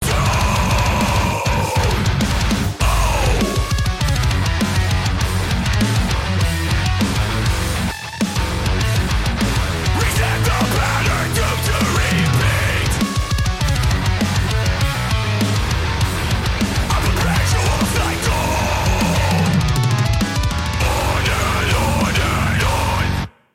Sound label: riff; gungrave erra